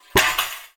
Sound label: war ui source drop jagged sheet metal snare drum snares